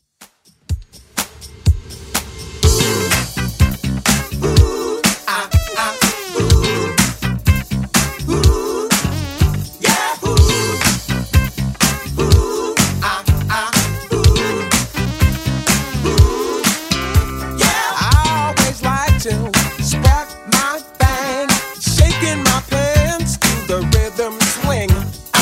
80s DJ Intro Outro Redrum 124bpm

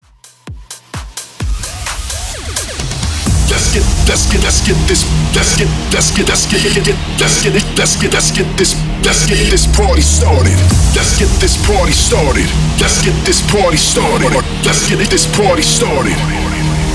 Party Starter Hype 129 BPM

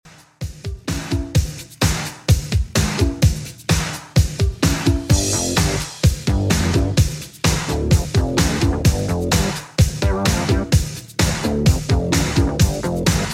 80s Redrum 128bpm CLEAN

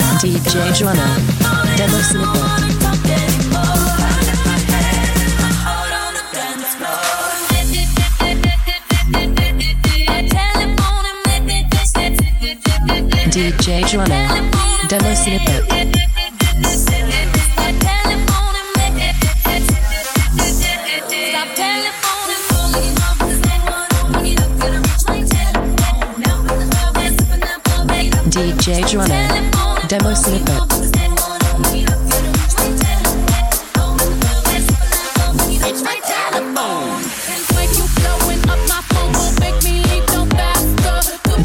128bpm, Fm

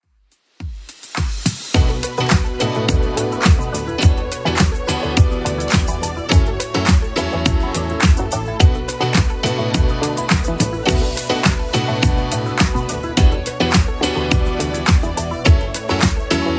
80s Club Edit 105bpm

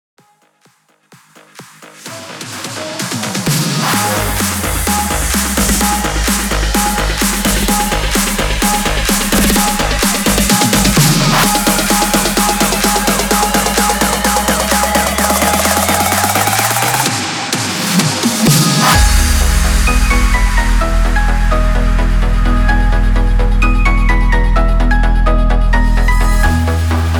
BOUNCE 128 BPM

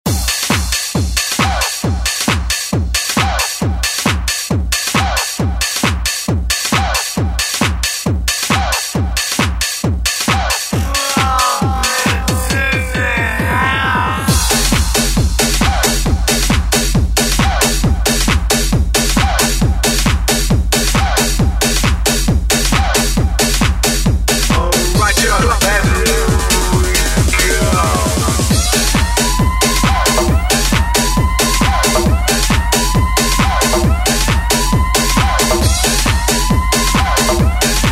Straight Kutz; DJ Mhark

